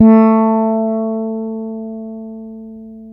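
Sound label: A 4, warm fretless, bass; Spectrasonics; Bass Legends CD 1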